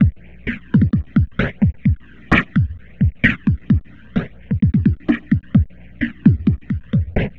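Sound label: Messup Loop, beats; Sonic Foundry (Sony Creative Software); Esoterik Beatz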